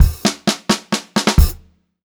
87 STR FILL, Funk-Groove Drumloops; USB Soundscan vol.38; AKAI

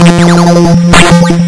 lsdj waves; wavetables

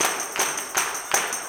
160 TAMB BRK; Blues & Soul

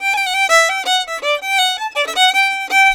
160 G violin, Celtic Flavours; USB Soundscan vol.42; AKAI

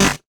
Bent Snare

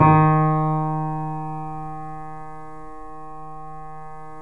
D#, Piano; InVision Interactive; The Piano

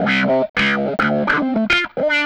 Guitarlike, Leads And Riffs; Sonic Foundry (Sony Creative Software); Synchro Funk

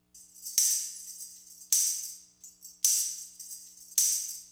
Garage Funk Tambourine, 70s Analog Funk; Sonic Foundry (Sony Creative Software); What it Is!

